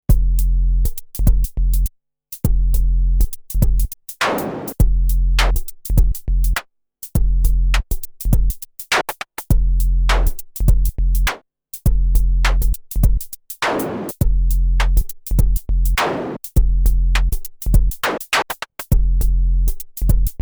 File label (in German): Layer BD + SD + HH + Sinus Bass